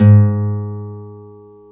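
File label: GUI NOTE, acoustic guitar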